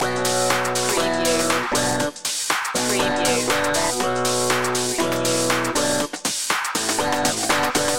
loop, Funky / Groovy

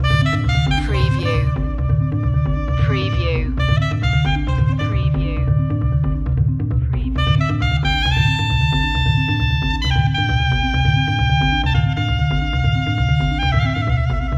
sport loop

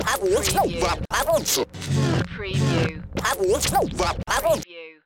Scratch Crazy 95; Professional killer vinyl scratch perfect for sampling, mixing, music production, timed to 95 beats per minut; Musical Sounds; Vinyl Crazy Scratch 95 bpm

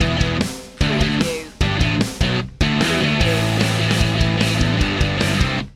start from scratch stinger, live rock

